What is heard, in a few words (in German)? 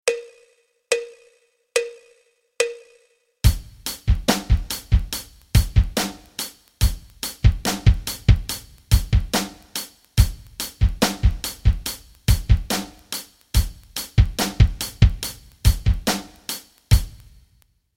rock rhythmen